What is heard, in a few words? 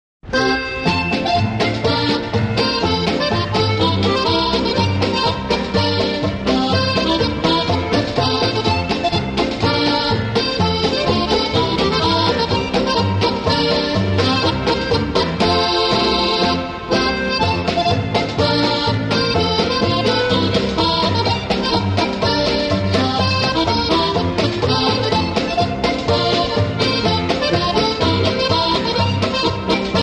Polka